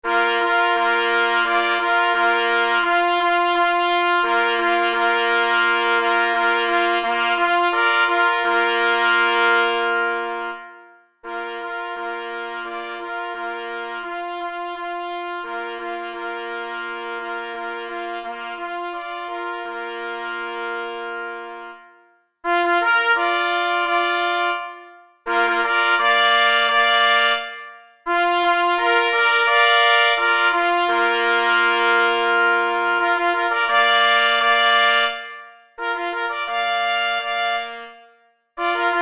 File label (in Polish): na 3 plesy; Cz. Trybus; Hej na byka